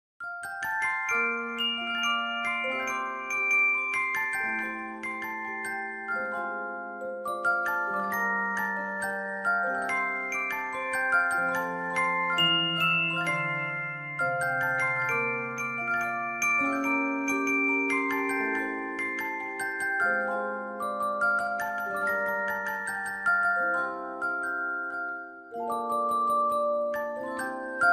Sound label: music box ver.